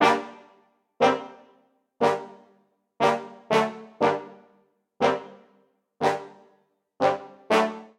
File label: Ostinato Stern G Minor 120 bpm, Brass Quartet; MIDI Clips; Ableton; Spitfire Audio